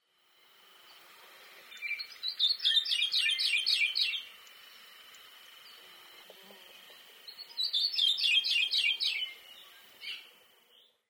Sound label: maui parrotbill